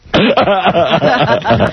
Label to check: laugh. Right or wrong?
Right